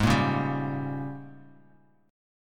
G sharp Major 7th Suspended 2nd Suspended 4th, Bass Standard; strummed; chord; 16,13,11,12